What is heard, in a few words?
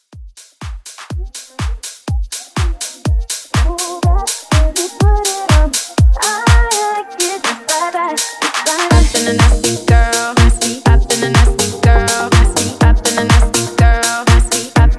Hybrid Mashup